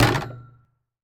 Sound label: Street Ball Glass Back; SFX Kit